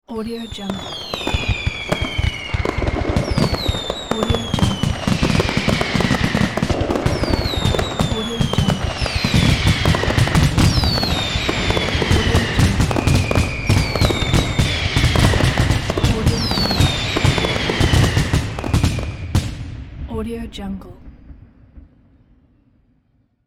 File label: fireworks